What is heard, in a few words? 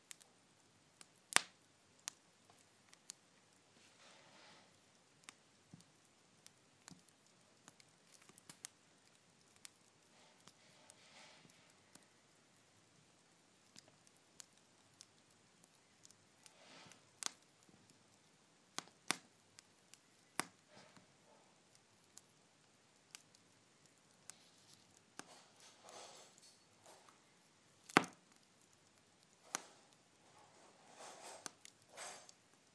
sound of a coal fire kindling popping and sizzling subtle background sound of dinne; subtle background sound of dinner approach